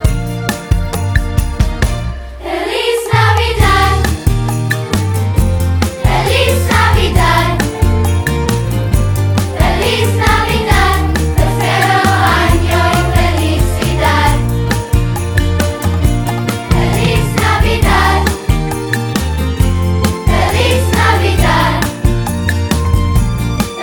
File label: choir